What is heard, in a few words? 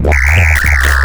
ANIMAL NOIS; Big Beat Samples & More; AKAI